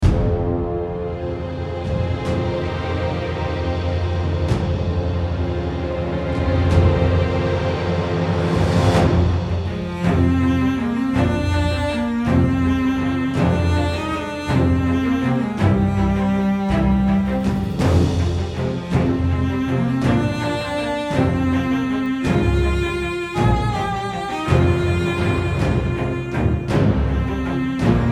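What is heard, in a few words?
Music Theme; Doodlekingdom; Doodle God Puzzle Collection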